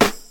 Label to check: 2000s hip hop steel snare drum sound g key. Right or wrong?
Right